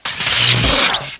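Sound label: force field; Star Trek Deep Space Nine - Entertainment Utility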